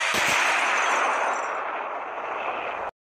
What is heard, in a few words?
crash sound; flight pilot